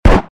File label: Deagle